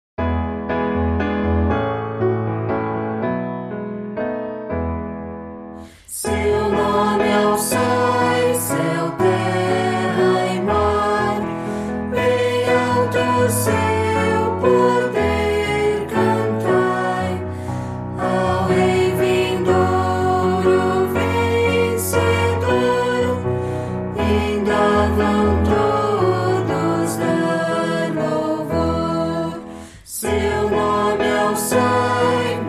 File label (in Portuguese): voz piano